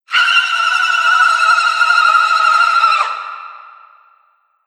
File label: Scream Banshee, monster; Monster Sound Effects; horror sound effects